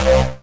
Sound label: Synth Hit C